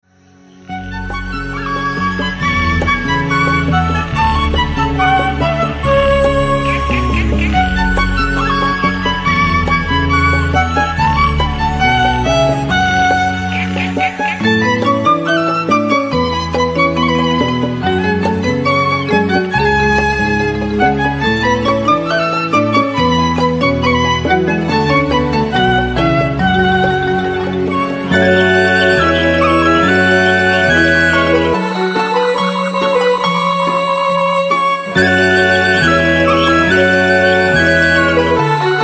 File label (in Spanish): musica de la selva